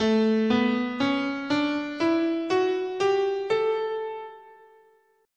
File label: A Mixolydian